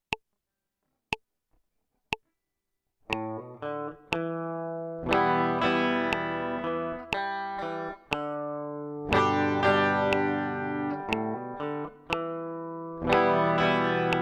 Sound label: intro melody full version